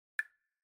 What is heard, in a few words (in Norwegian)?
Klang